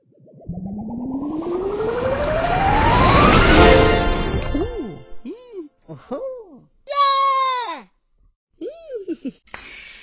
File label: Tom grows up